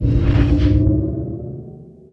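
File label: system weapon power increase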